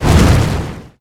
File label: whoosh fire